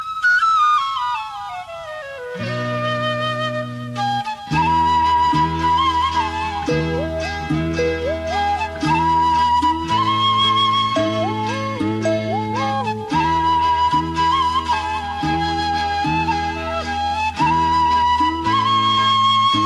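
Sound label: flute; classical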